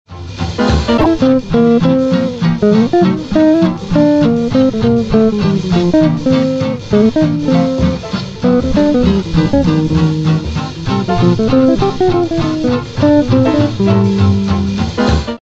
blues

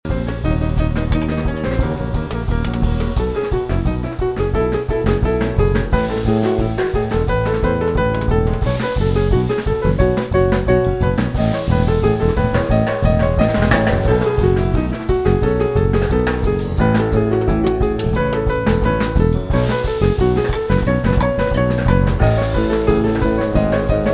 piano trio

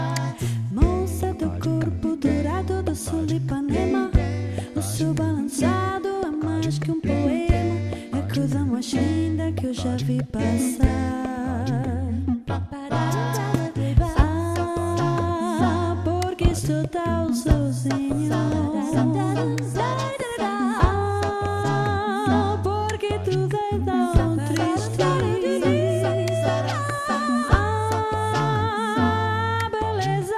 vocal jam

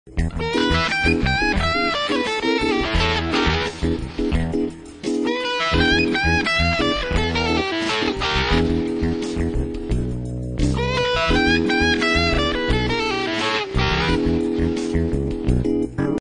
blues

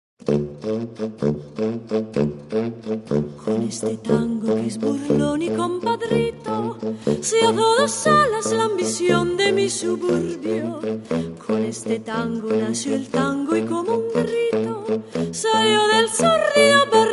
Tango Ensemble

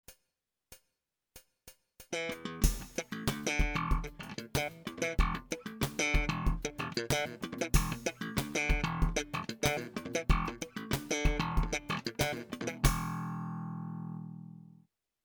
Slap, bassoelettrico

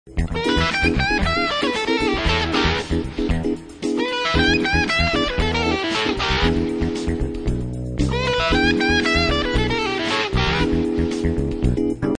blues

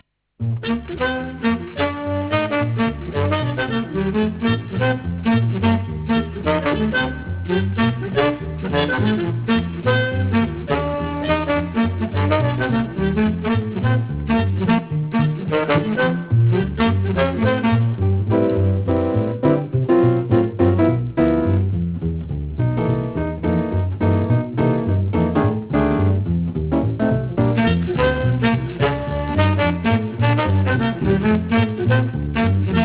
piano

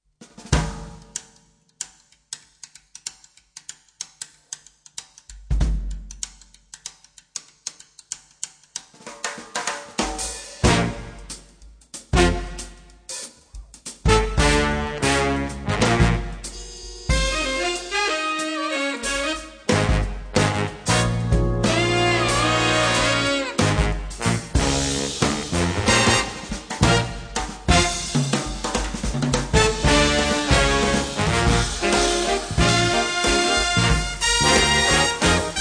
Slo Funk

